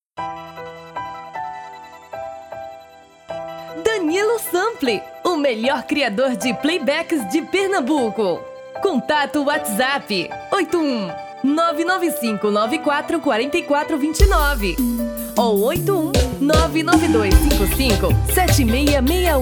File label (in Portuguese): Piseiro feminino